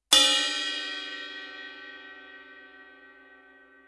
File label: med ride, cymbal; sabian